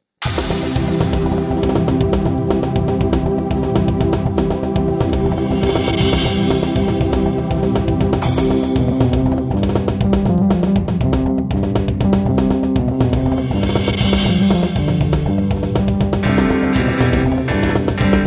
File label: bass